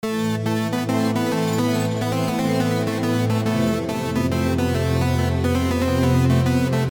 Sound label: march